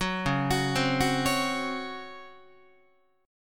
C sharp Major 7th Suspended 4th C sharp Guitar; C#M7sus4; arpeggiated

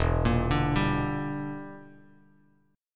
E Minor 7th Flat 5th E down Bass Standard; arpeggiated

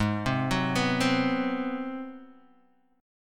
G sharp 7th Sharp 9th, Guitar, Standard; chord; JGuitar

